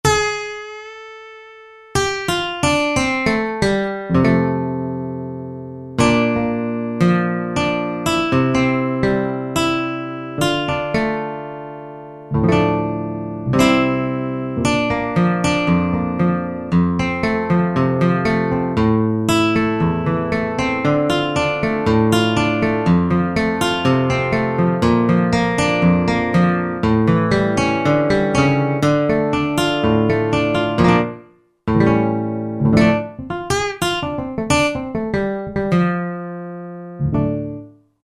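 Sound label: Real Acoustic Guitar; Country; Roland SR-JV80-17 Country Collection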